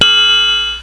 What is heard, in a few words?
DUCK; Arcade